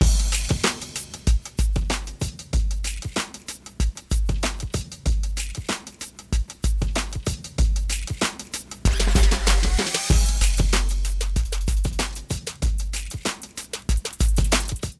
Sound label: music; U of PHX Factoid; Phoenix